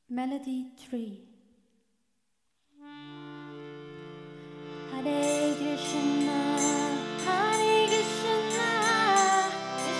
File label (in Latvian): hare krishna melodija, easy harmonium; sankirtan; badzan